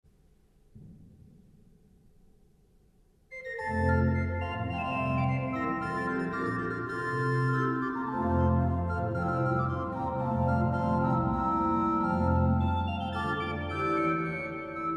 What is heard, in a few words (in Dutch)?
Holfluit en Woudfluit